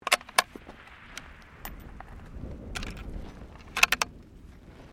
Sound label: RIFLE 6MMBR RELOADING; Rifles, non military; Rifles (Sound Effects:Guns)